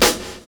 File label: OPEN FIRE; Univers Sons - Kitch 70s